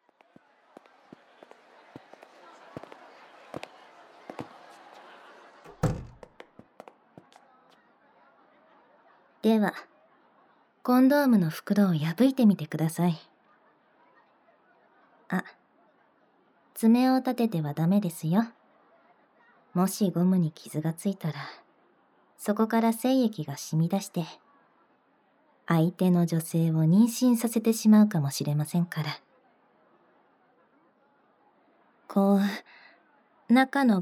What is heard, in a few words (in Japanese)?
事務的ゴム越し耳舐め手コキ